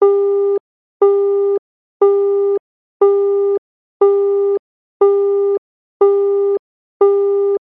telephone uk engaged busy tone

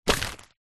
foley paper sheet a4 grab pick up hard in anger

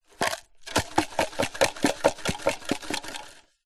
noodle pot dried plastic shake

